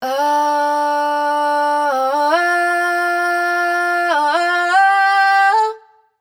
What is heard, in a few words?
riff Long Run Woah 105 C wet, Vocal; Slate Digital Omnivox Vocal Sample Pack